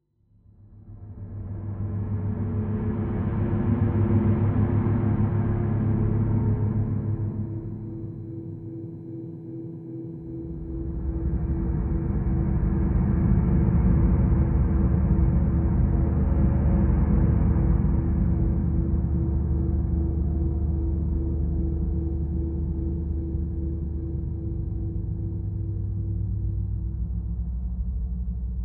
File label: Subway Gas Mask Explore Mood